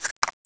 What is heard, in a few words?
next page